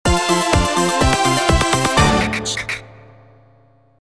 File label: Thunderous Success (Short); Blastdozer; nintendo 64